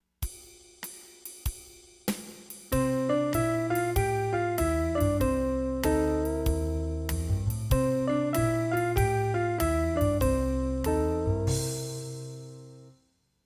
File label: Swing Rhythm